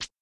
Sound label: jump; ovo